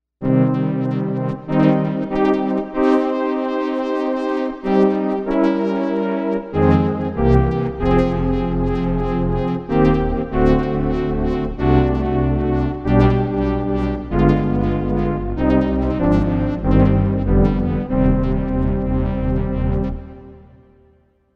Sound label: Kobol Brass Overkill